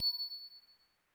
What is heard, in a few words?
tink